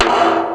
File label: Iron hit, Fairlight CMI III